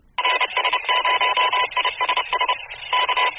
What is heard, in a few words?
ISS CW